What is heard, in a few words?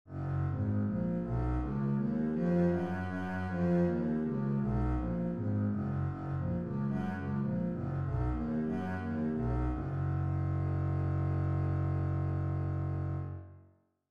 bass G flat major